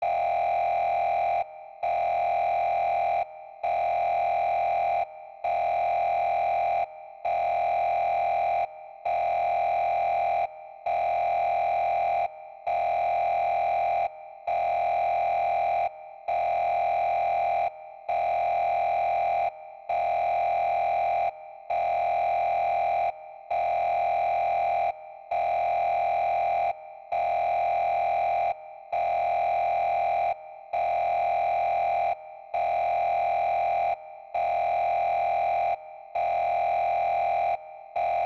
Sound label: Red alert